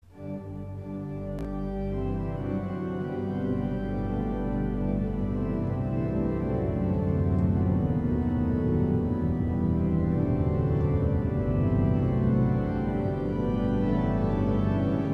Harmonea Athera, orgel

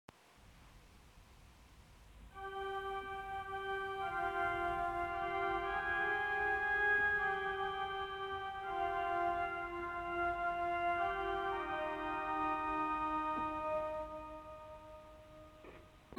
Vox Celestisreihe 8 4 Gambette Celeste 4, orgel

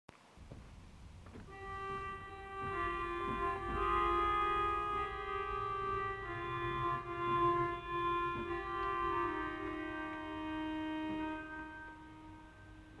Oboen Reihe 16 8 4 Bassethorn 4, Orgel

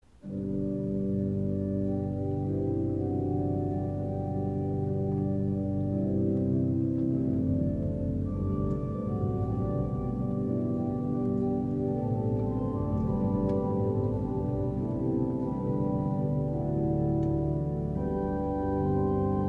Bordoun 4+8+16, Orgel

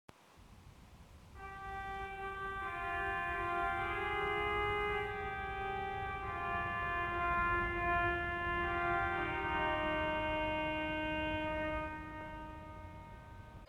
Cornopean Reihe 16 8 4 Cornopean 8, orgel

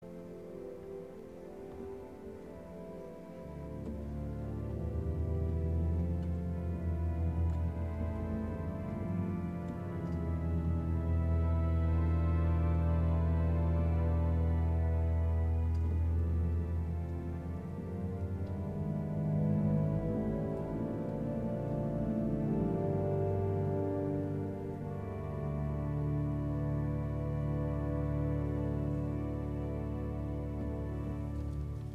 Vox Coelstis, orgel

